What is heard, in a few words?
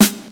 00s hip hop snare g sharp key; snare drum one shots